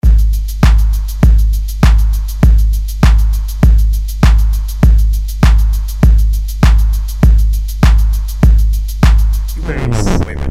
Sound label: treble and bass